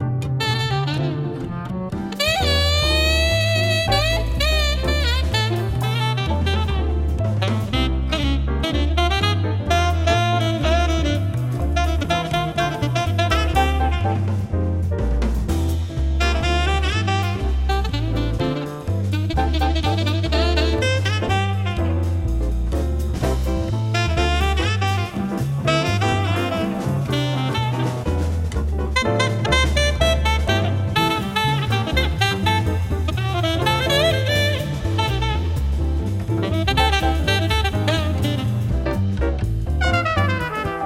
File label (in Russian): Музыка для чтения Атмосфера